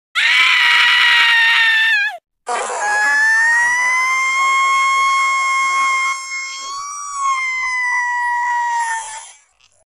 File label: female scream